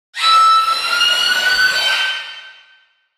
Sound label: wendigo angry, utterance